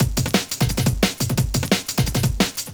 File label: 175, Breaks; Drum&Bass; EZ Rollers